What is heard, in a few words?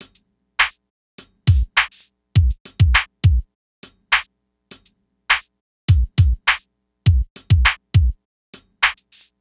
Drums, 102 Ebmin